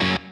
Staccato F#

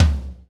Tom

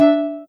soft hitwhistle; osu

